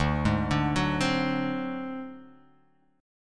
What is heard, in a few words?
D 7th Flat 5th D down, chord; D7b5 arpeggiated; JGuitar